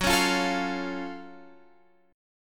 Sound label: Gb Minor Major 7th Gb down Guitar Open F; strummed; JGuitar